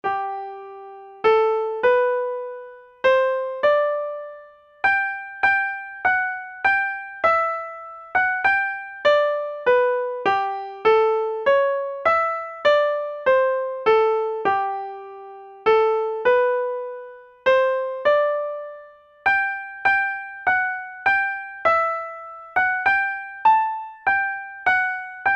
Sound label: Shepherds Wife Waltz